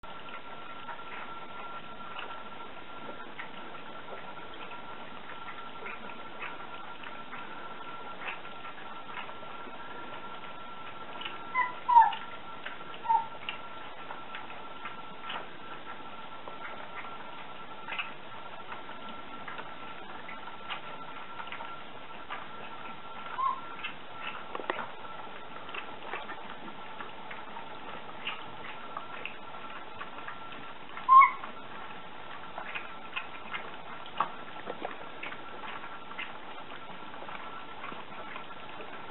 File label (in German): Welpen